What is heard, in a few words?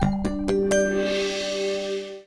Jingle Puzzle Solved; rayman 3 hoodlum havoc gc ps2 windows xbox 2003